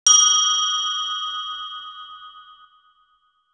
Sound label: drop pink